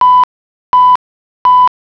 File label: alert; 3 pulses - Alert Tone